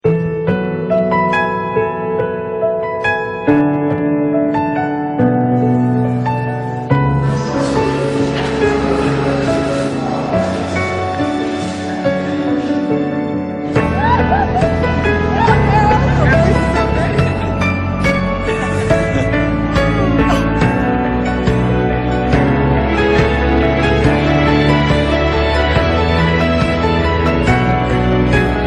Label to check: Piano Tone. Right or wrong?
Right